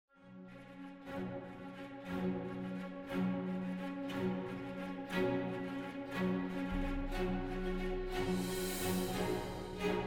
travel stormlands; Mount&Blade Warband

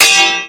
sword clank metal; Mount&Blade Warband

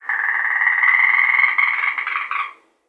frog long; ambient, random animals, frog groups; Left 4 Dead 2

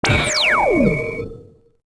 long laser; Sid Meier's Civilization 4 Complete; Beyond the Sword; Final Frontier; Units

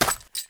footstep; Ace of Spades

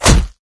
rifle swing hit survivor; Left 4 Dead 2

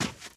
grass, footsteps walk; Left 4 Dead 2; boomer

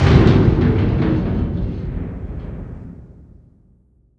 metal debris; Left 4 Dead 2

